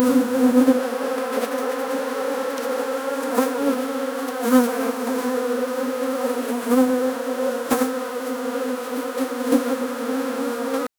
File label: swarm of bees approaching